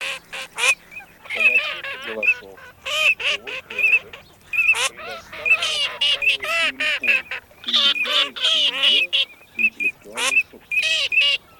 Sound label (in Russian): Чирок-свистунок Alzavola; plurifon